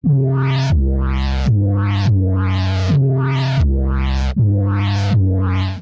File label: Bass Hits F Minor 165 bpm, bassline; MIDI Clips; Tonal; Skitter and Step; Ableton Factory Packs